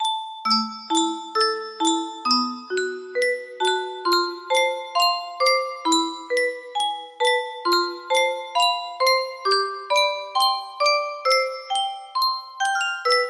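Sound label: music box melody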